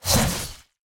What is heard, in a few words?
action attack; rengar